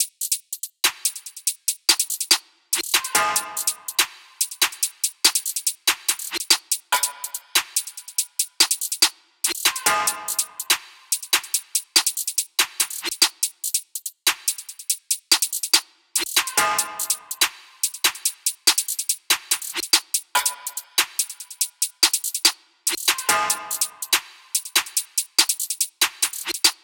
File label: 143BPM